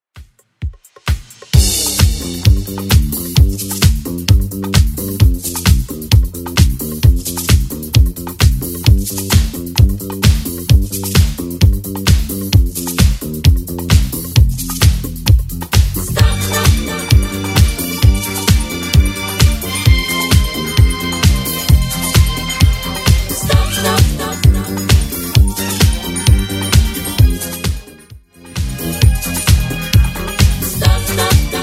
80s 131 bpm; Redrum

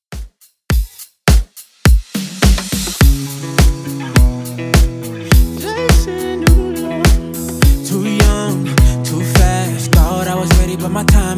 Top40 Redrum 104 bpm

